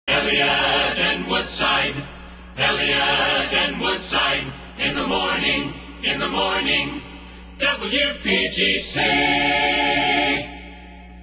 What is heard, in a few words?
jingles, choir; wpgc; 1980; jam; Elliott and Woodside; halleluah